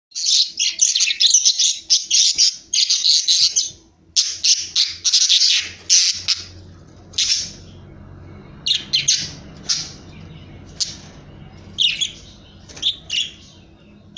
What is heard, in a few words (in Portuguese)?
Periquito ingles cantando